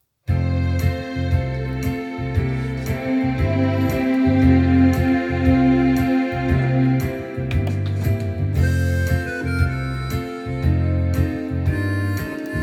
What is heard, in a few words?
blues